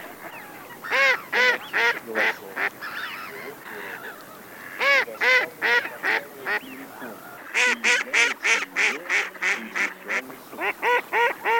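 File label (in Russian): Кряква Germano, манок; plurifon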